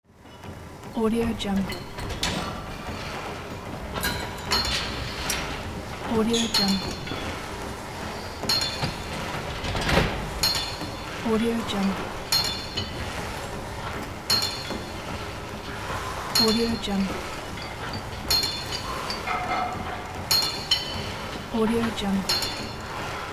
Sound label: gym ambience